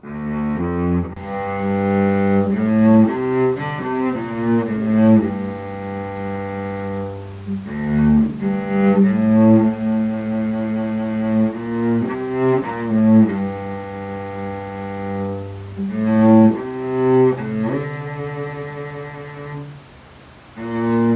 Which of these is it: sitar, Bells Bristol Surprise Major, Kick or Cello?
Cello